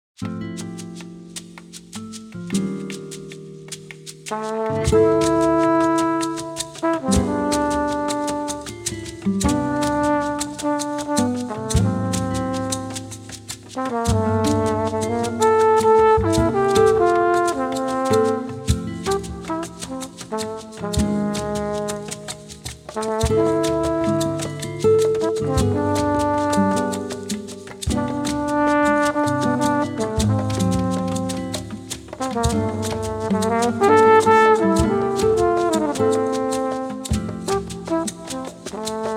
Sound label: cricket dance